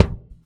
Pound, Kicks; Airwindows

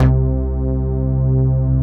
PUNCH BASS

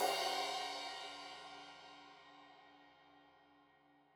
Crash 15in L edge, Cymbals Stereo

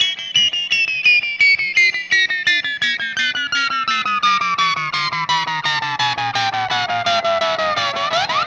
Static FX Guitar

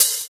Open Hhat, Hihat; Equipped Music; Loungin House

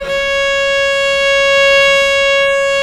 VL C#4, VIOLE, Historical Instruments; USB Soundscan vol.23

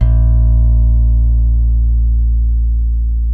PRECISION BS, Bass, B 2; Spectrasonics; Bass Legends

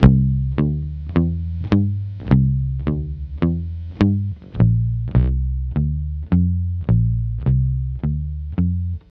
Multi Guitar Swing Bass-C, 105; Zero-G Kocktail Kollection; Construction Kitz (Lounge)

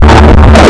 FX GTR, GUITARS KIT

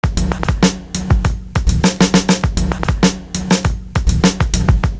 WHISPER BEAT; Univers Sons

